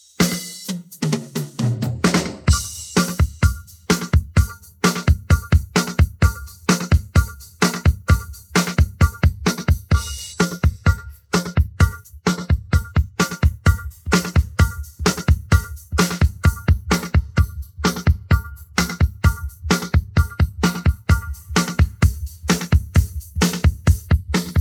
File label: pattern, drums